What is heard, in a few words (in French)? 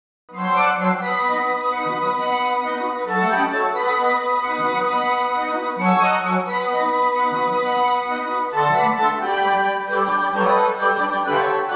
Bolero ensemble de flutes; Ravel